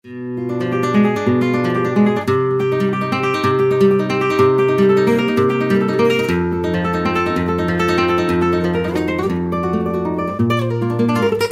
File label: Flamenco Guitar